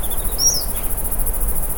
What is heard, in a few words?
Sublegatus modestus